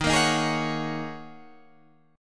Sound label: G sharp 7th Suspended 2nd Suspended 4th